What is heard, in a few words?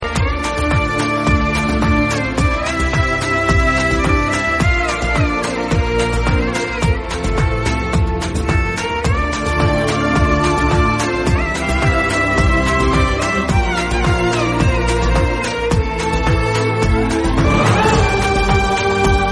best heart touching bgm